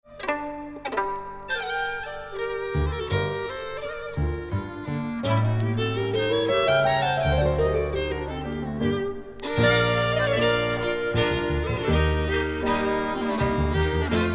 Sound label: Danska Polska